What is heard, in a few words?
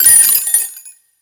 gambler win; Corrida Romance; wazdan